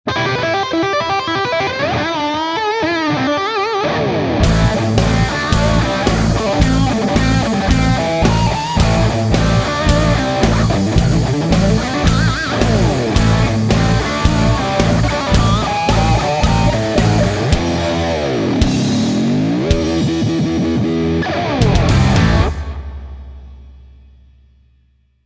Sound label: Brown Sound Celestion G12M-65 Creamback